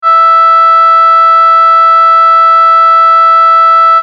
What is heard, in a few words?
oboe e, acoustic